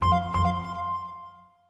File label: windows longhorn usb fail